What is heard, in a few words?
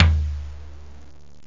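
low floor tom, drums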